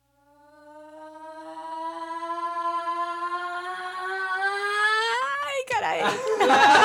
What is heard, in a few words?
aaaaaaaaaaaaaa